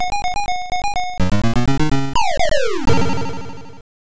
Game Over Music; Pac-Land; ZX Spectrum 128

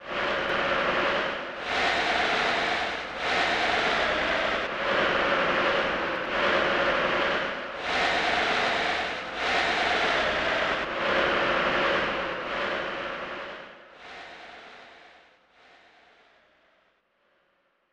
Crowd Chanting; Zico Soccer; snes

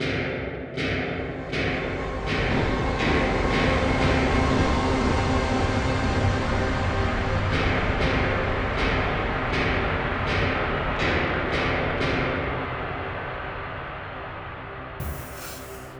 Danger Tonal; Insanely Twisted Shadow Planet

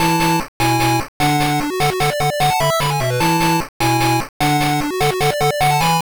Victory Jingle; Ninpuu Sentai Hurricanger; Hyakujuu Sentai Gaoranger; Chou Sentai Super Battle; Pico

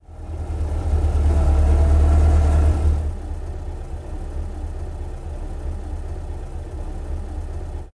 Car Stop Idle

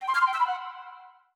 Game Start

